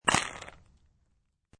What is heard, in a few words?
Quick crunch of flag and vibration of pole as its dropped onto the green.; Golf Flag Drop; Sports